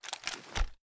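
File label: weapon foley assault rifle reload empty fast end; gmod; viper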